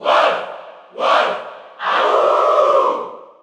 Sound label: Cheer Spanish; Wolf; SSBU